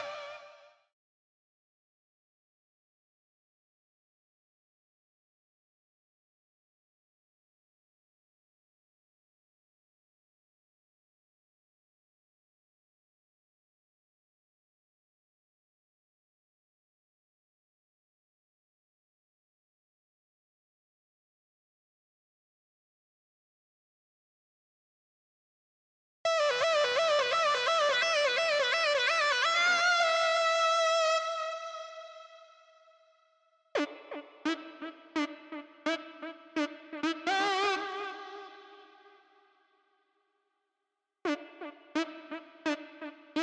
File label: Doom Mix Stem Loop Layer 3